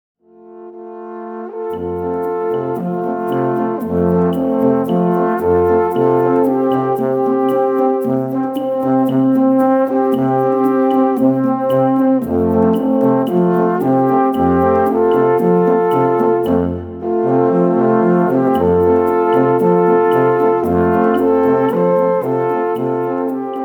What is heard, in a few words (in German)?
Alphorn Beguine